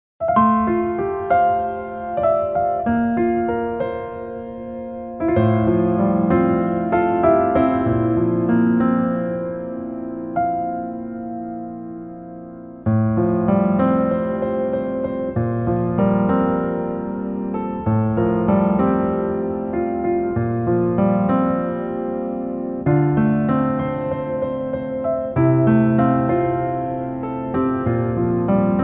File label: Piano